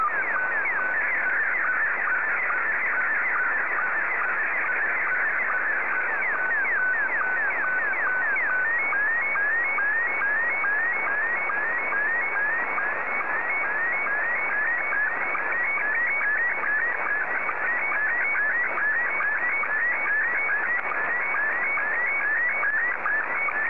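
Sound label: SSTV, Scottie 2; JA3QJQ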